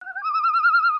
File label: loon; Counter Strike Model Pack; CS:GO